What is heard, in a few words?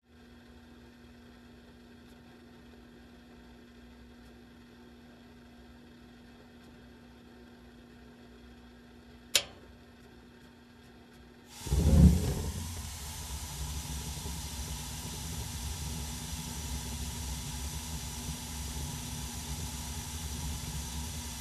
Switching on small industrial machine